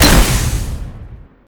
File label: flare shoot; weapons; tf2classic